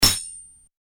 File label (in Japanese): 斬撃